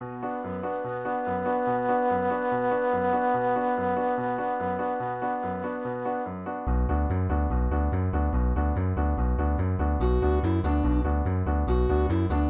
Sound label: piano bass viola ocarina